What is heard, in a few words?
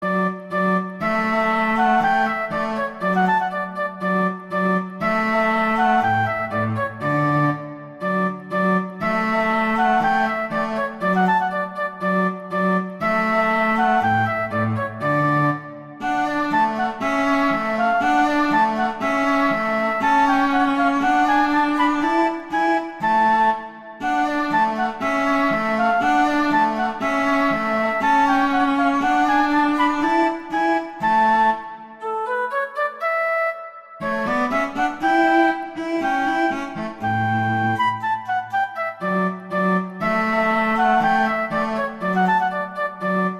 Duet; Mozart; Duets